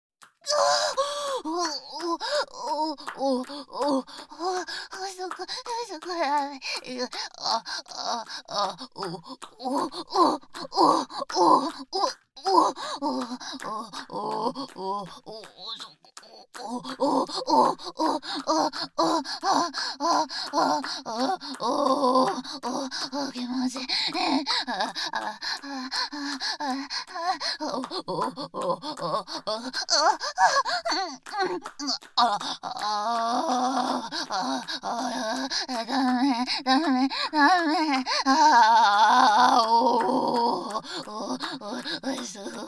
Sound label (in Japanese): オホ声; SEあり